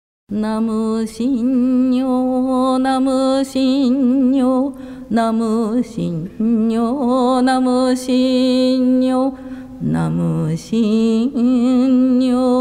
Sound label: Jojusan Chant; Shinnyo-en